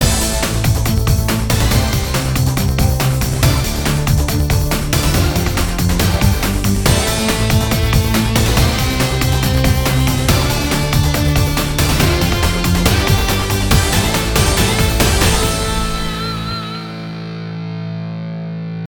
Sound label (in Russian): Музыка для Рекламы; TVC Music 30'